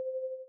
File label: puzzle